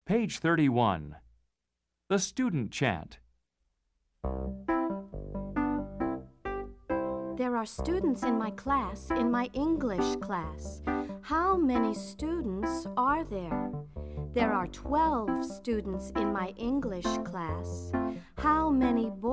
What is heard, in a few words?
The Student Chant (Solo); Let's Chant, Let's Sing 1